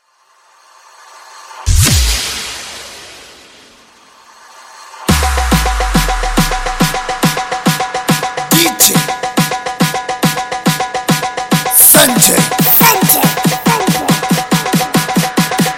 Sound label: beats whistle destruction; instagram